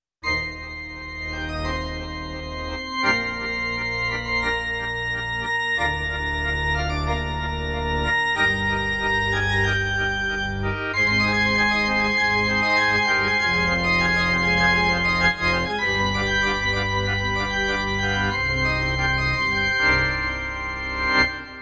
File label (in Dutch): Kerkorgel, roland v accordion church organs